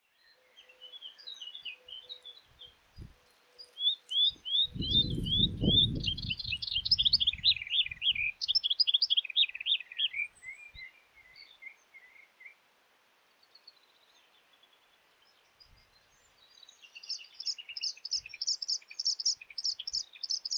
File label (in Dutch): Orpheusspotvogel; Lijdijkweg Oostkapelle orstiaan Beeke